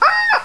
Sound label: chick attack light, chicken